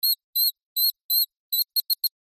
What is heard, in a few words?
animals birds chick baby tweet designed